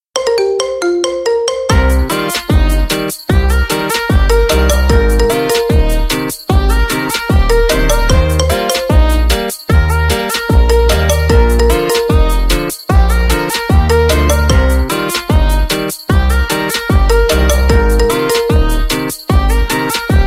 marimba remix

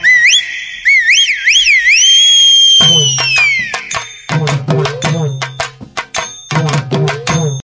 whistle mix

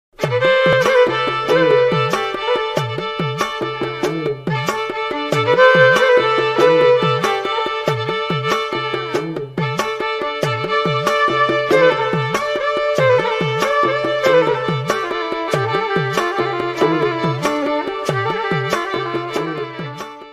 irish violin and folk beat mix

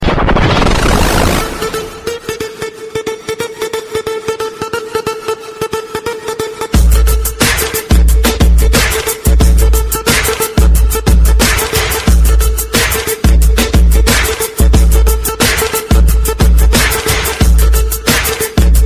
bass dance; ringtone

